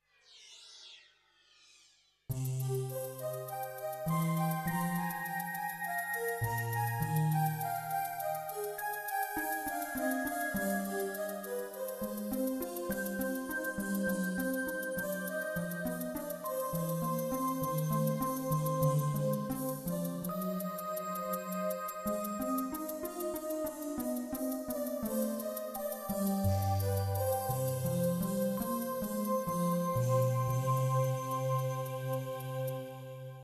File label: roland jv880